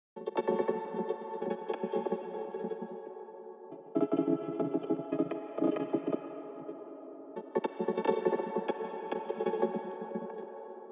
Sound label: Indecisive Piano Thoughts